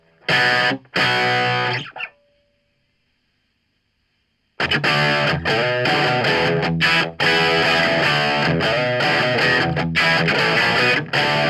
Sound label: Nasal Phase